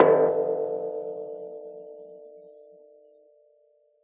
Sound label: Metalbump